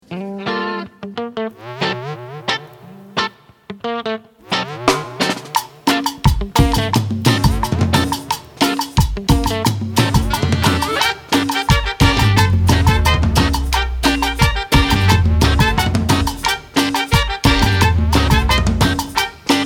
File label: Male Vocalists, Cumbia; Z-I-v Summer Contest 2013